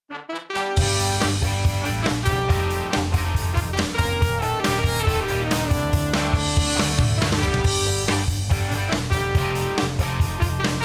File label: tenor